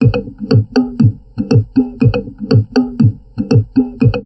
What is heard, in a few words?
TALK, Drum Loops Crazy Processed